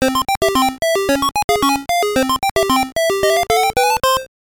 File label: Game Start Jingle; pac man gameboy